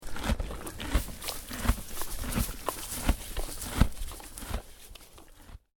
Horse Eating Munching Hay, Horse chewing dry gras; Horse chewing dry grass; Horse eating munching hay 4 (short); Horse Sound Effects

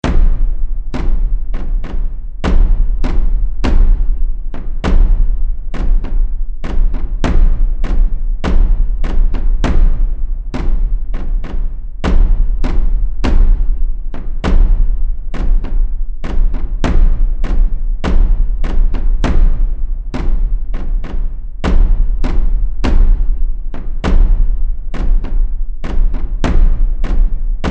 Drums Of The Undead, scary soundscape

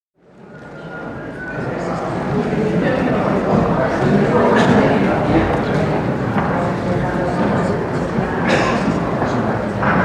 General atmosphere - spectators arriving; 1969; Crowds; BBC

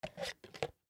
Cd Rack Spin Lid, Cd Rack Spin Lid Shut; Spinning; Objects

